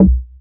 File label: GIGA C4, BASS; Q Up Arts; Dream Experience